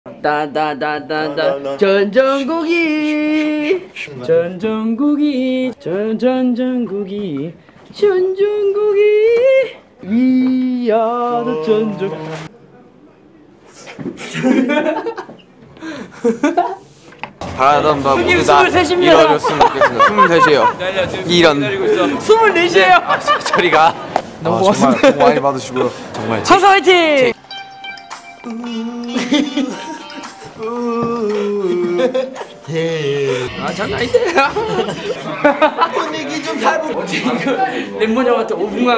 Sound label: laugh and singing